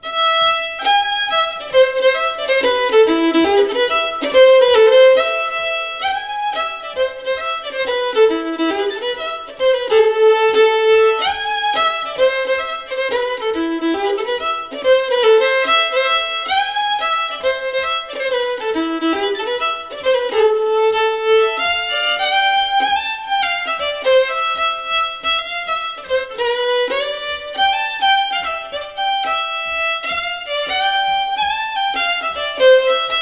Polska; Ulfs Creek Polska

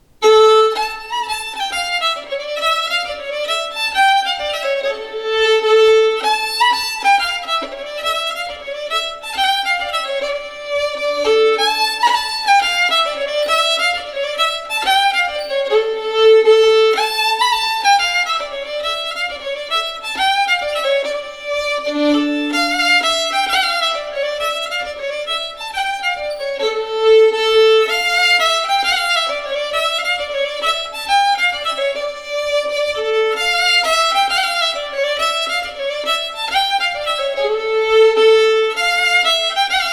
brudpolska; Skogspelles brudpolska

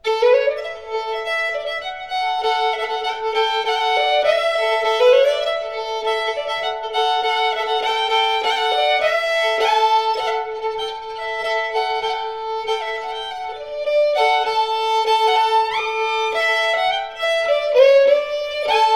Marsch, Engerdal; e Helmer Kjolvang

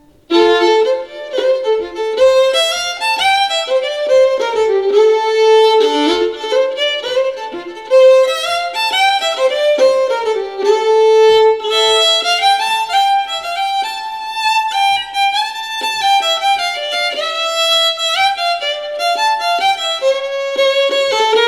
Troskari Polska i F

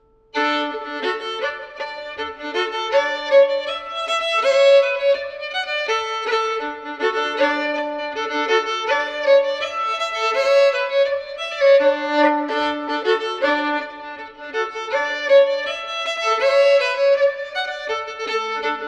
Schottis; efter Arvid Eriksson

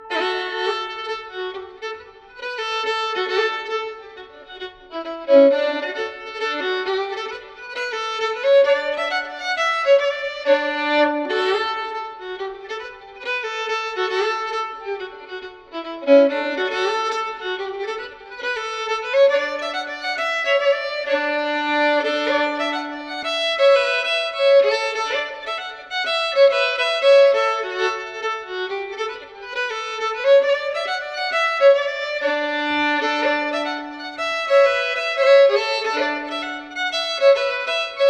Runnom; Antagligen fr Roros

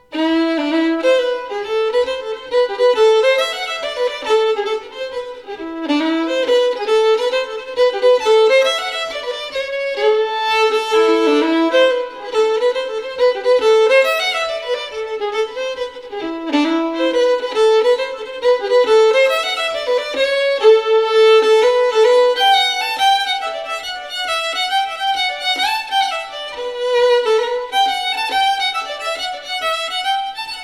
Polska; Polska från Mellerud

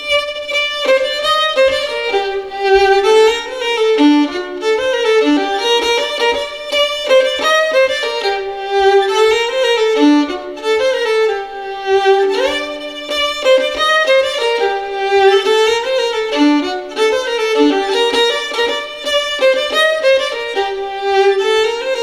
Vrapolskan

